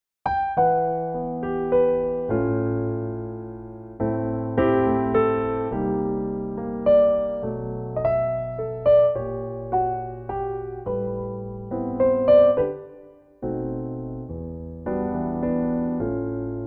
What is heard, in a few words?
G Piano